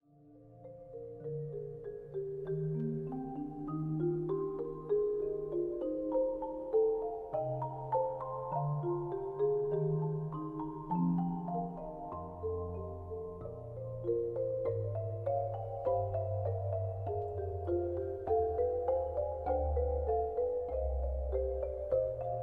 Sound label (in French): trio de marimbas sonate en trio marimbas; bach